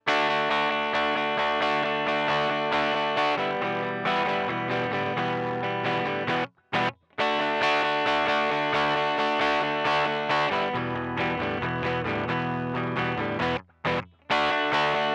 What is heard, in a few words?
processed no fx